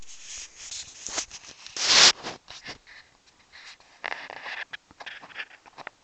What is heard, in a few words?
ambient